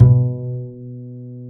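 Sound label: double bass B2; Univers Sons; Jazzistic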